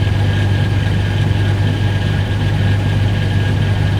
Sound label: washing machine drum loop; world misc